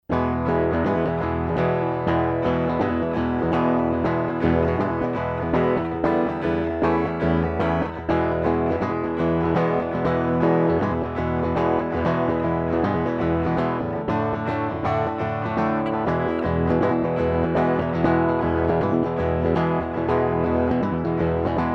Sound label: Phoenix Audio Ascent One DI Fender Strat